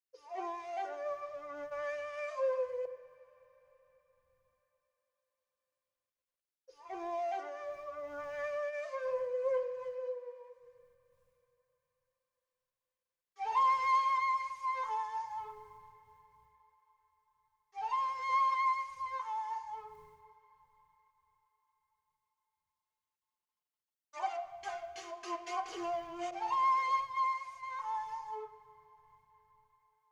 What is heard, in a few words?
110 BPM G Min Flute; Cymatics; Cyprus